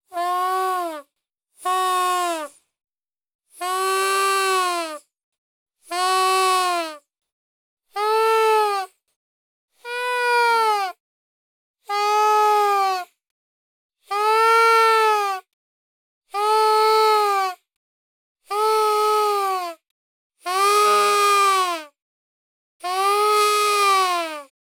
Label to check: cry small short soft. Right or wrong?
Right